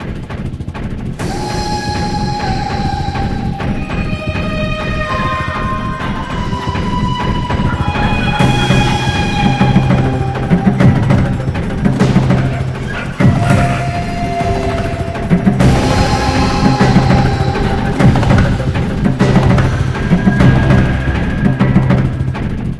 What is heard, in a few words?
freespin music; conan